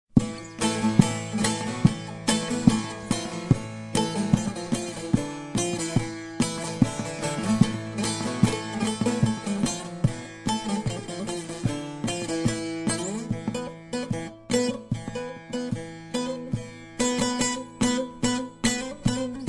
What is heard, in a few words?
saz; dut saz